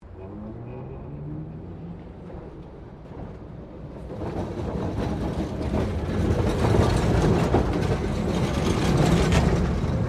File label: Tram Melbourne W Class Passing Points; Melbourne Trams; Streetcars (Sound Effects:Vehicles); SoundEffects-Foundation4